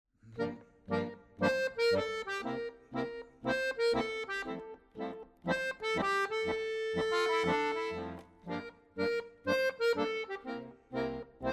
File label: Swing Time